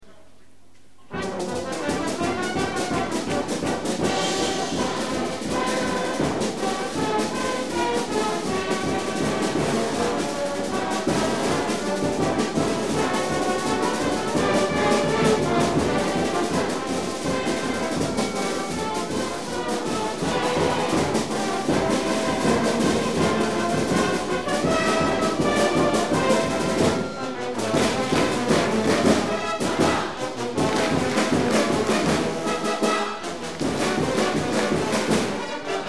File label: pep song; star lake